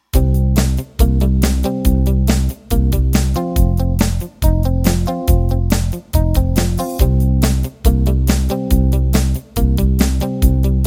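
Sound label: Country Boogie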